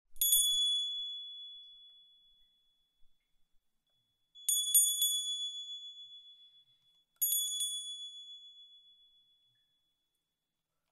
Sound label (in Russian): kolokol v magazine